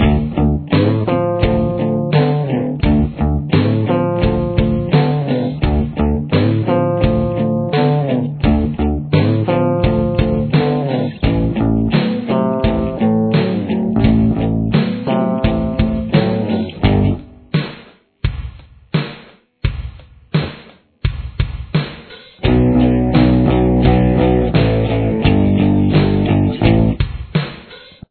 verse main

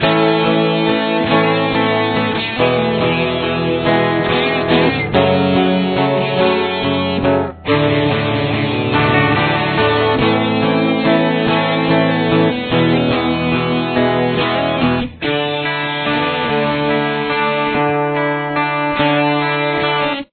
solo

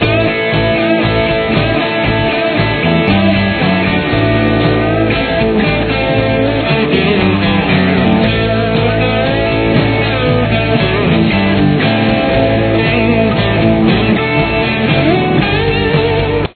solo main, guitar

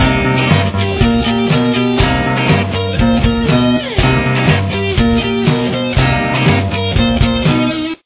guitar

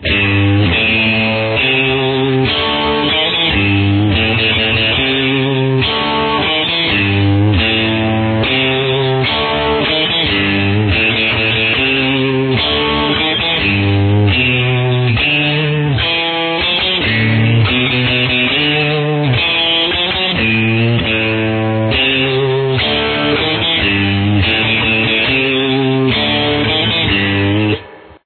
Main Riff, guitar

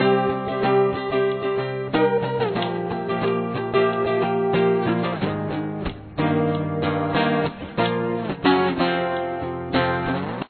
chorus, guitar

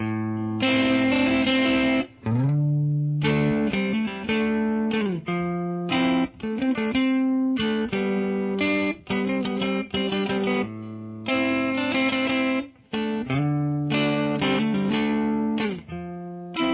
verse lead distortion, guitar

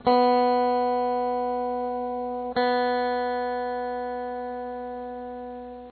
string b, guitar

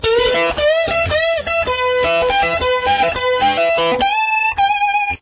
shuffle in e

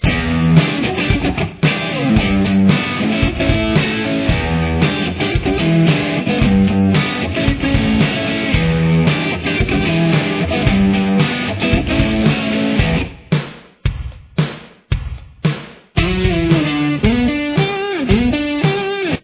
verse guitar

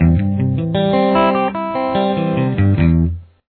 arpeggio C open, guitar; CAGED Open Arpeggio Shapes

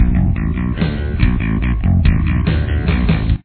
bass verse

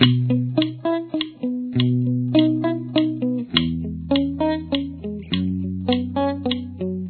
slow, guitar

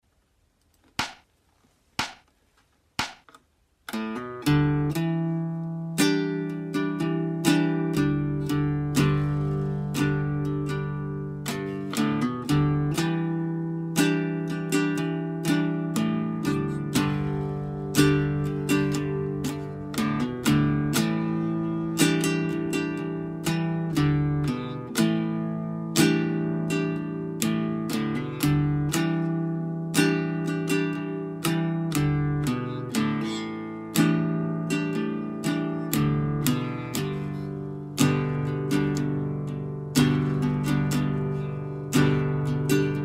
Acoustic Main Intro Backing

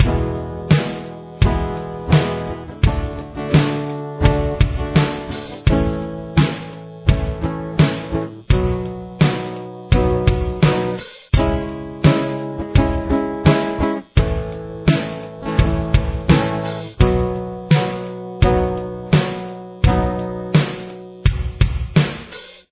bridge acoustic, guitar

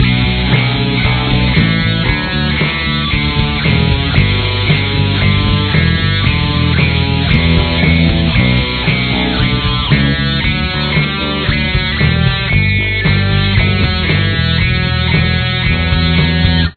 solo

